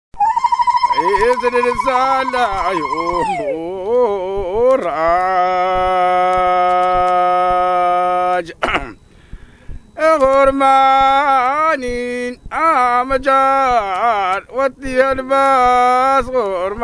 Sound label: rebab